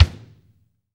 KICK, Ambient Kick; Roland L-CD701; Ambient K1

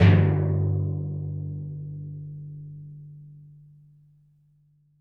Roland TD-20 Percussion

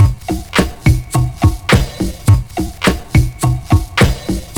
big bossa, 105bpm; Zero-G; Freak Beats

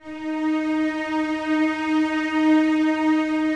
D#4, Cellos, String Ensembles; Miroslav Vitous

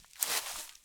Rustly Step, Footsteps, outdoor

